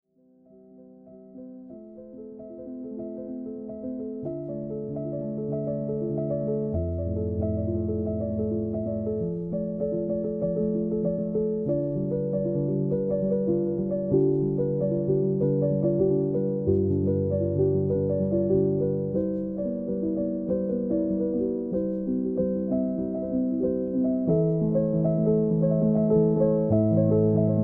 Sonnaia Muzyka Dlia Sobak, Uspokaivaiushchaia Sonnaia Muzyka; Sonnaia Sobachka; Zvuki

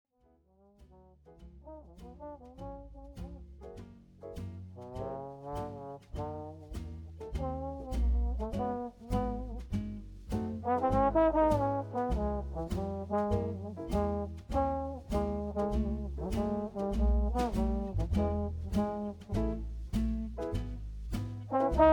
Lounge Bar. Legkaia Dzhaz Muzyka; Legkaia Dzhaz Muzyka Dlia Zavedenii

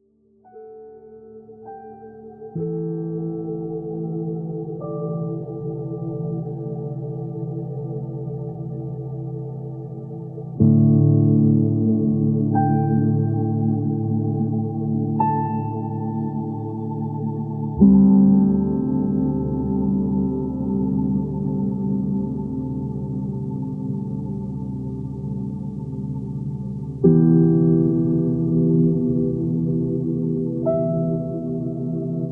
Klassicheskoe Fortepiano, Noktiurn Dlia Sna; Uspokaivaiushchaia Klassicheskaia Muzy

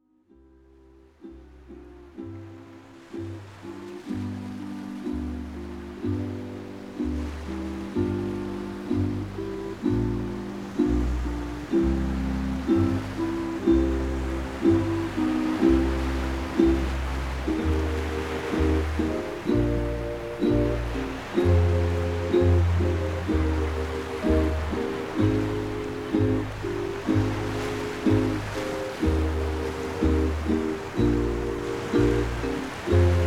Shum Moria, Uspokaivaiushchie Zvuki I Shum Moria; Shum Moria Kollektsiia

